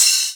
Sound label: Open Hihats, Cymbals; Vengeance Samples